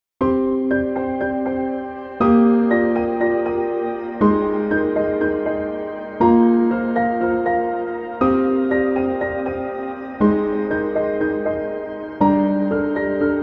Grand Echoes; Heavyocity Ascend

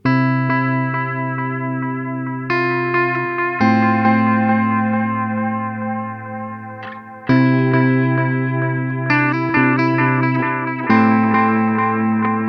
EHX Pico Rerun med Modulation

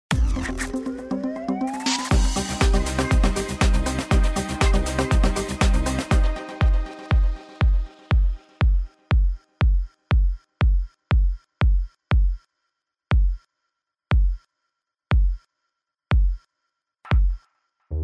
Korg Pa5x KAOSS

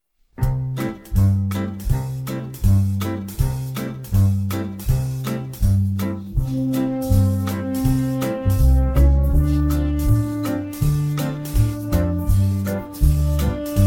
Instrumental

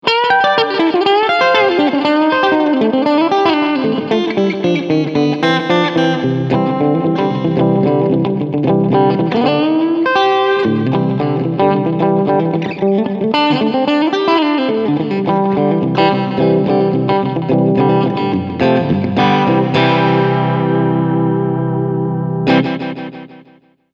Short Delay

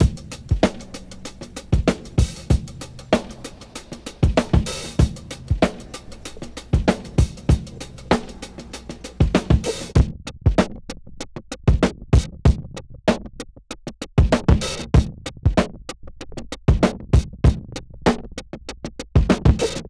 Old Drums Mini Filter Envelope Follower Drive

